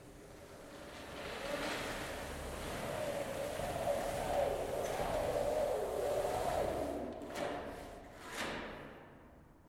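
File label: roller shutters lowered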